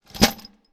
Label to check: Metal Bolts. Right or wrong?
Right